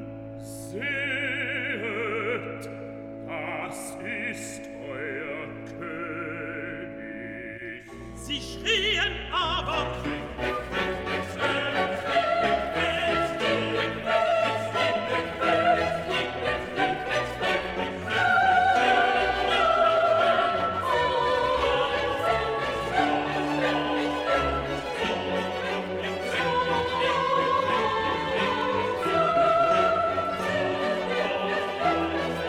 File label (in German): BASS, Stimmen mit Klavier; Johannes Passion